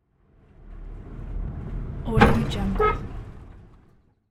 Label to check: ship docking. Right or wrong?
Right